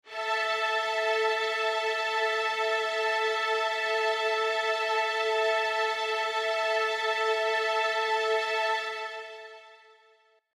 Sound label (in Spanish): Quinta y cuarta en acorde